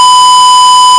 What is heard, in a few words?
Sqr Osc 1000